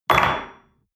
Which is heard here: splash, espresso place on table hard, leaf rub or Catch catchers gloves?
espresso place on table hard